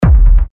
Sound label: Kick E; TECHKICKS1